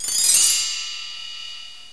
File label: Bell Tree; TG300B; Drums